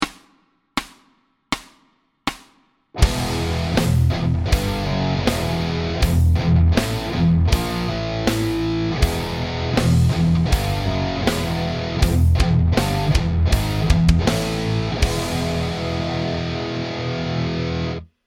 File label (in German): Metal Riff im Stil Hörbeispiel